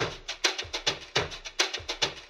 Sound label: yeeh; Drum loops